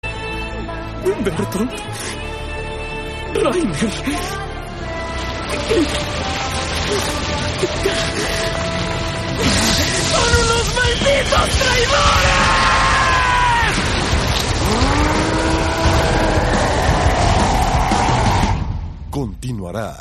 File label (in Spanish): grito; eren